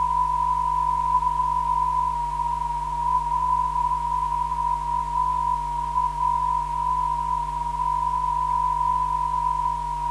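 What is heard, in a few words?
406kHz, non-directional beacon; LUP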